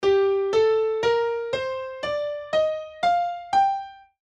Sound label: Gm scale